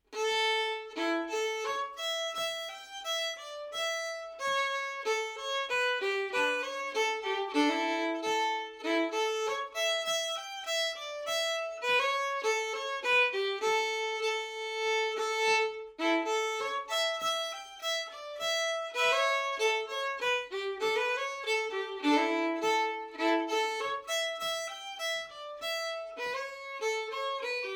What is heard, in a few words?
slow; Akkar